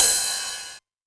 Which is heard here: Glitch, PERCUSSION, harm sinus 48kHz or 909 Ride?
909 Ride